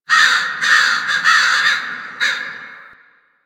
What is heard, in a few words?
Fall Crows; Warframe; Ambience; NineteenNinetyNine